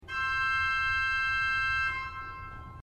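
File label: octav 2 C, organ; srednja vas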